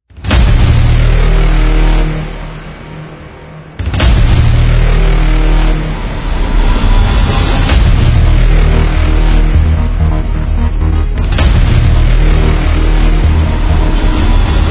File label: Dynamic Trailer Ident short